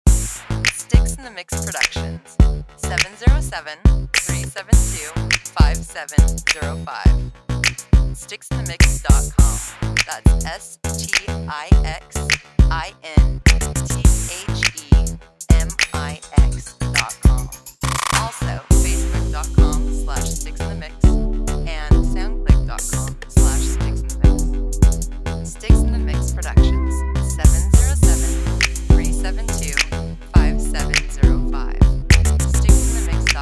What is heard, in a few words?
Blip Bass